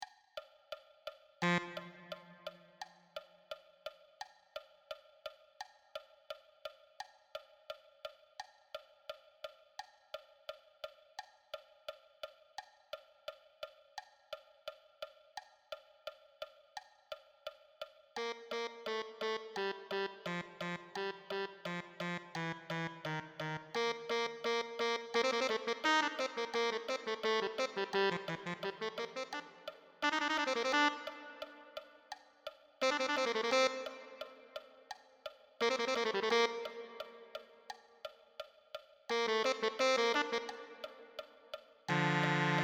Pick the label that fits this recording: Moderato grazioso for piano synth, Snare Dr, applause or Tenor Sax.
Tenor Sax